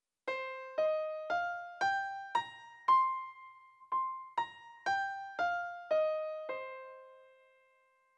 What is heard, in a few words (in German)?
MOLL PENTATONIK TRP